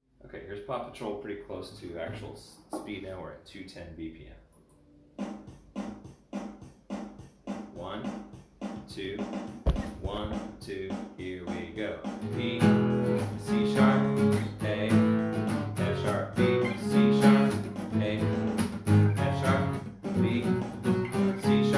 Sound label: Chord Practice 210 bpm